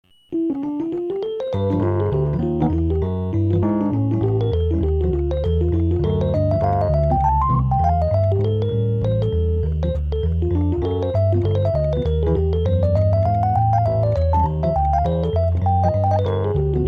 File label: etude C, jazz piano